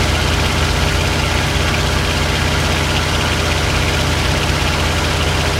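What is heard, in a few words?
zero cockpit right, engines; War Thunder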